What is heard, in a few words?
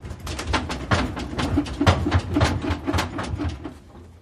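Metal Cart Clank Clunk, carts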